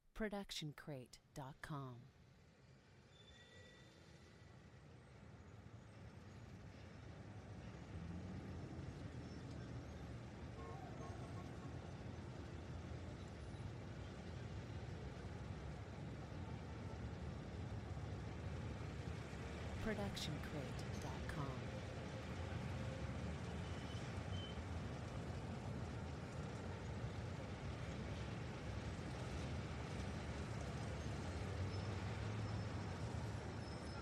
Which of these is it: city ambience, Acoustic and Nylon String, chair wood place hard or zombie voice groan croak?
city ambience